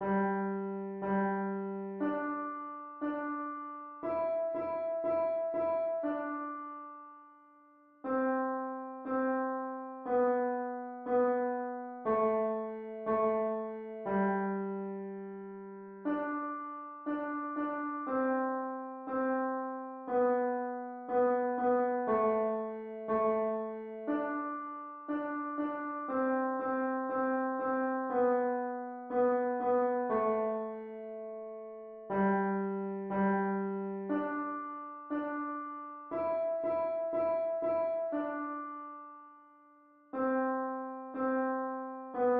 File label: piano